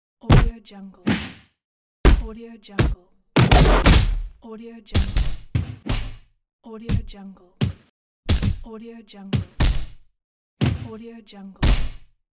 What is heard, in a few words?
punch